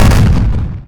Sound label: explode; fuse; extremely intense mathematics; godot; explosion and fuse sound